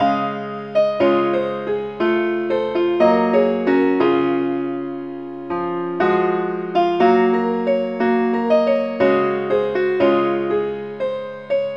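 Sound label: keyboard Only key G flat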